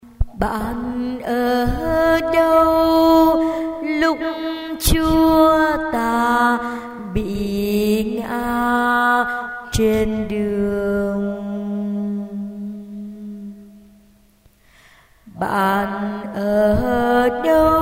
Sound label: basso